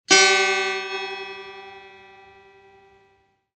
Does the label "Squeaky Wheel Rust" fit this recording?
No